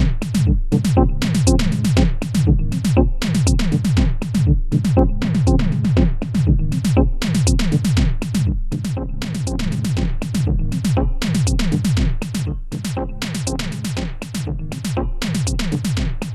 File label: Tascam model 2400 comp eq